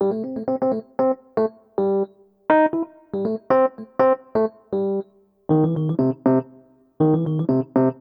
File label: Chorus C, джаз; keys; jazz